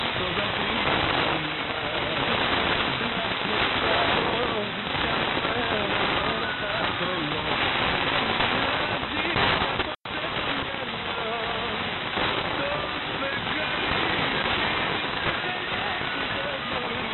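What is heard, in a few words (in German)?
Griechischer Pirat, Kurzwelle; 1629khz; HDLA Loop12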